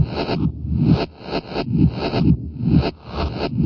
131 bpm crunchy crunchy munchy, drum loops and breaks